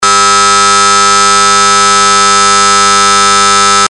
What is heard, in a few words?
buzzer